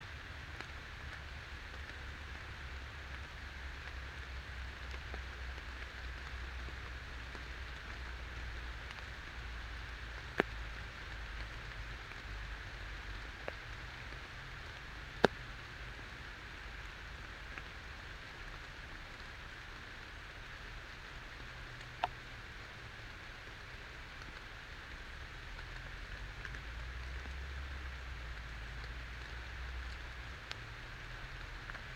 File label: yamanakako sound